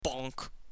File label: Bonk; Assemblee SFX